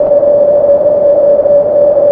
MINIMOOG

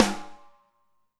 Snare, Alesis DM5

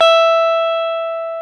GTR, Dual Guitar; Club-50 - Foundations Roland

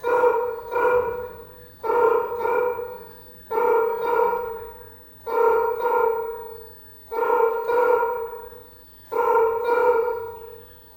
DOVECALL, Heart of Africa; Spectrasonics; BIRDMEN

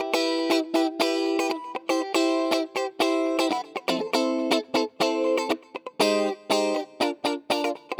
Guitar, 120bpm; Construction Kits; Zero-G; Nu Disco; Nicengroovey C

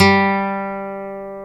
G3, Acoustic Guitars; AKAI S6000 CD-ROM - Ultimate

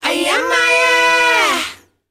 kids 5th, S. African world voices; ILIO; Vocal Planet

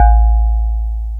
DX PIANO

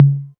808 Tom Mid, Drum Machines

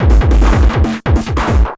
PW 909 140, Hard & Loud Techno; USB Soundscan vol.01; AKAI

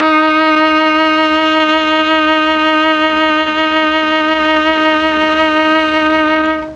D#3 VIOLA, Mellotron; Mike Pinder - Mellotron Archive CD

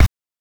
BANK1 BD, NES DPCM; MIDINES

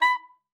B5, alto sax; Xsample VOL-2

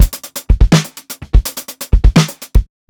SWUNG 83 BEAT, DRUMS LOOPS; BASEMENT DRUMS VOL 2

